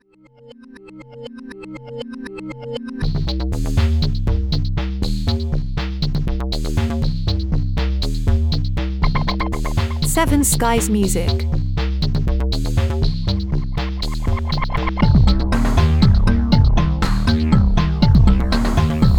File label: electro construction industrial